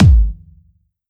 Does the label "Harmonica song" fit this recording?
No